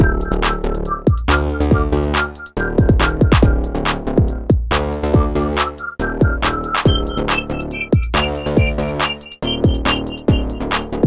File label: Halloween Break 8